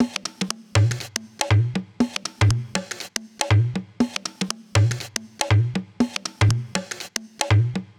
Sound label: Perc Loop 120, Loungin House; Equipped Music